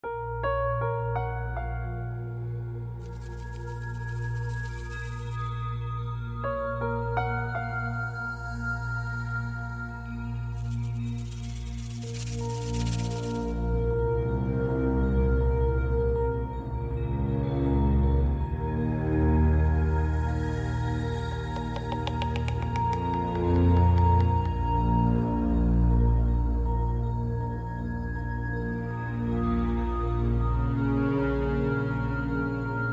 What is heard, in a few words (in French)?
suspense